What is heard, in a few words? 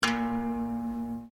HAMMER DULCIMER STRIKE SINGLE P, Old Chinese Hammer Dulcimer; Strings (Sound Effects:Musical)